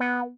B4 meow

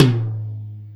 Drum mtom; osu

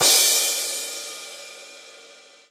crash hostile

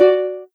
soft hitwhistle; osu

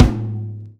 Tom Hit; osu

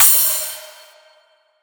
cymbal; osu

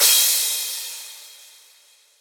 long cym; osu

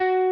Arp

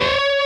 bend full Left-hand vibrato

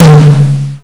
TOM

